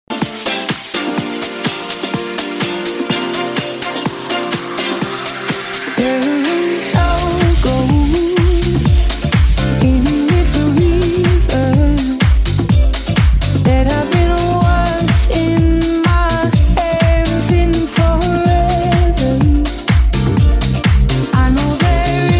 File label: Club Party Banger